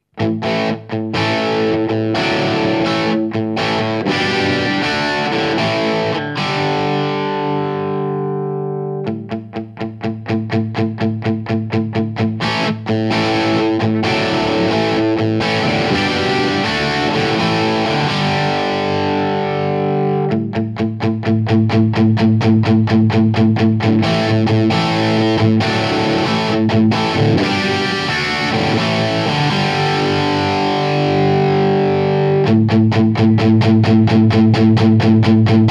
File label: tsl crunch bb